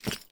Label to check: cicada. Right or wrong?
Wrong